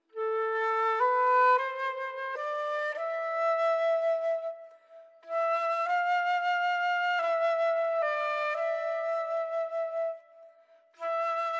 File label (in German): Floetenimprovisation; Die Nacht der spirituellen Lieder 2